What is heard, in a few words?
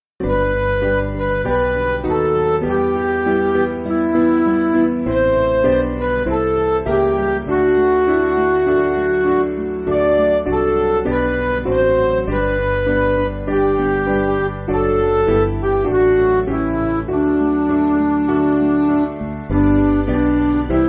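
Midi, Music Backing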